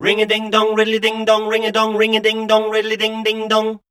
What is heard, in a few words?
SCAT, Voice Spectral